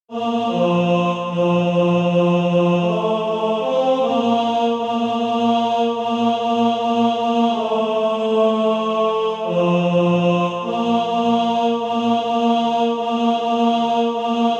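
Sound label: tenor, SATB Practice Music; Forever with Jesus There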